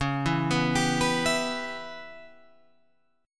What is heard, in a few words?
C sharp Minor 7th Flat 5th C sharp Guitar Open F; C#m7b5; arpeggiated; 13,11,11,14,14,11